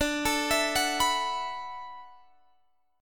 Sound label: D 6th Add 9th, Guitar, Open G